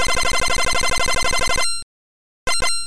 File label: Ice Beam; sfx; attack moves; pokemon; gen 2; gsc